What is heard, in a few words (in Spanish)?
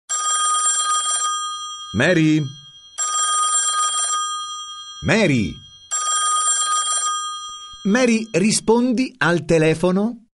telefono